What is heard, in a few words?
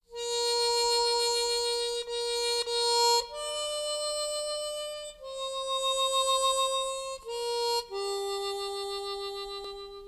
harmonica; ulzana